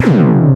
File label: Lazer; Jumpless; World Online